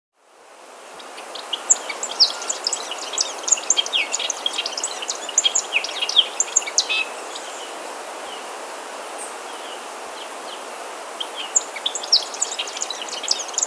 Golden-Rumped Euphonia (Euphonia Cyanocephala), Andean Birds; S. America